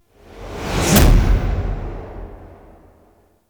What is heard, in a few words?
stinger; Warframe; NineteenNinetyNine; Stage8ShitboxConvoy